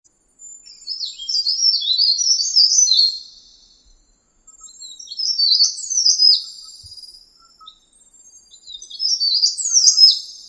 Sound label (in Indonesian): cikrak daun ngeroll